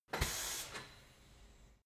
tire changing machine hydraulic separator short burst